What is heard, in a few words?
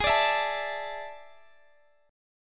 D Suspended 2nd Ab; Dsus2/Ab; strummed